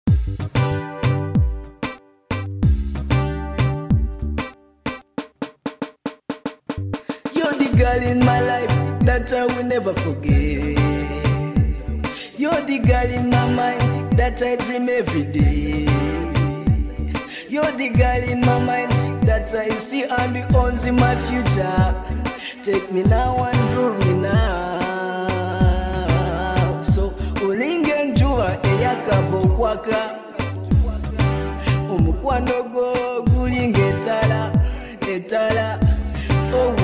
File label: Acapella